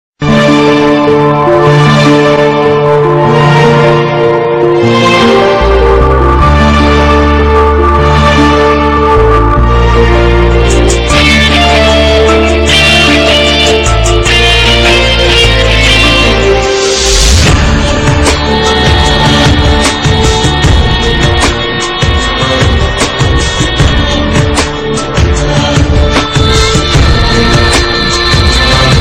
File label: Background Tone